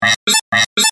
Multimedia Alarm, Technology, Multimedia, Alarm, Element; Alert Sound Effects; SoundEffects-BlastWave